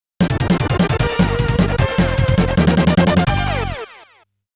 STAGE CLEAR; Wild Guns; Natsume

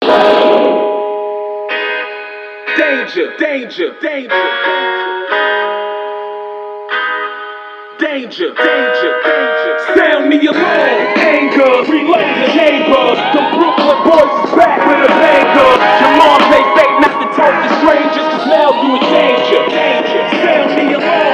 radio music

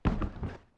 body drop; SFX foley; lucius ii the prophecy gamerip 2015 pc macos linux

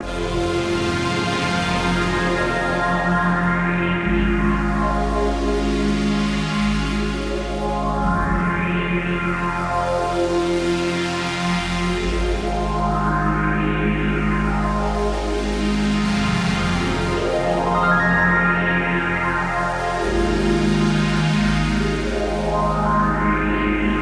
Pad Synths

